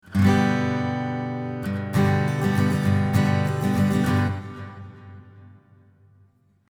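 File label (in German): Tascam Model24 A Git Schoeps Mk4 FX Delay Large Hall, akustische Gitarre; Gain 3 Uhr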